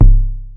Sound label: Bass Drum, Cwejman S1 MK2, Drum Machines; 300 Drum Machines